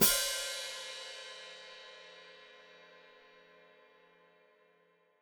Hat Closed Open, Hi-Hat; Deeply Sampled Drums; Cymbals Stereo

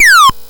Apple II FX Bird Chirp

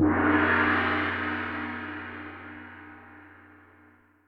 GONG, Percussion, Yamaha DTXpress

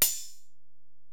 Ride, Alesis DM-PRO, Drum Machines

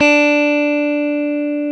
GTR, Dual Guitar; Club-50 - Foundations Roland

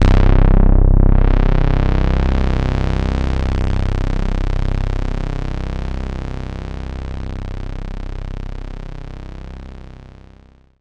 C1, Synth Line; 90 s Sample CDs; NiceBeats; SynthLine VOL-31

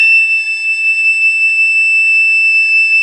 FLT PICOL, Flutes, Picolo& Flt; Club-50 - Foundations Roland

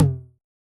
Tom, Yamaha DJX 2B

